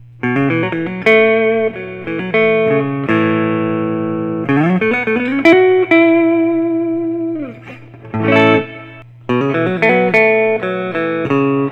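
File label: collings city limits deluxe ice tea sunb, guitars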